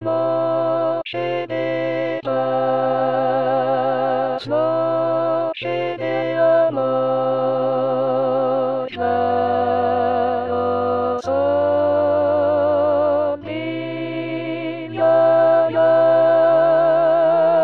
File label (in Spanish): nochedepaz A voz, con voz; Coro "Con dos Bemoles"